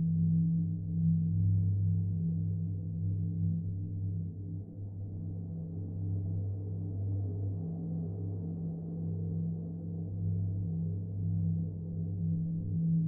editor drone; Source Engine; Portal 2

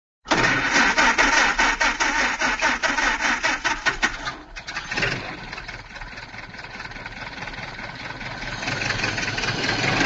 auto, model a start and pull away; cartoon

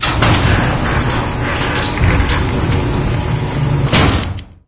sliding overhead open; ambient; source engine; ep2; outland

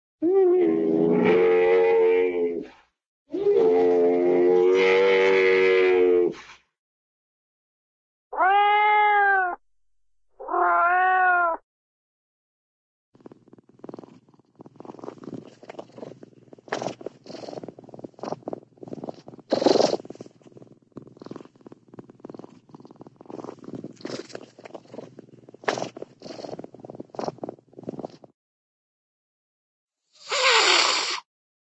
cat meows, animal; cartoon

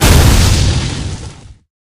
spell mirv explode secondary; halloween; video games; source engine; tf

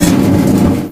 airboat pontoon scrape rough; Source Engine; video game; vehicles

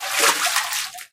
water wade, footsteps

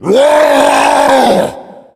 tank yell, voice yell; source engine; left4dead2

